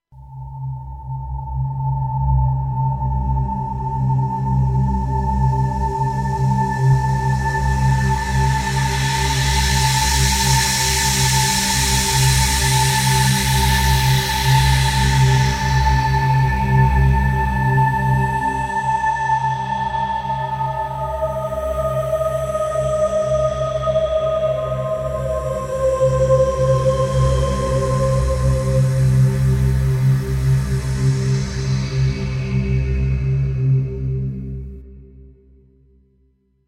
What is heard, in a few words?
One Shot Sample long